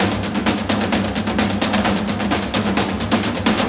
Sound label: Percussion